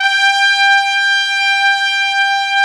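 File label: TRUMPET, Brass; AKAI S6000 CD-ROM - Ultimate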